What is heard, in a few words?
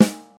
00s natural acoustic snare sample g sharp key